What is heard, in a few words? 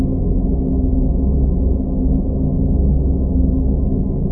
underground loop, ambient